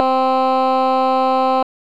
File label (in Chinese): 美声